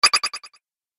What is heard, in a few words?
Game Delay Squeak, Video Game Sound Effects